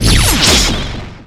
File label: Skill Swordman Full Gain